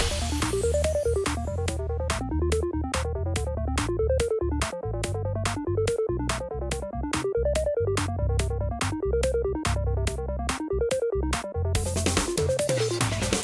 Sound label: Low Key Loop; A Hat On The Moon